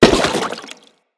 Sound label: Crewman Step Water, Footsteps; Enemies; Corpus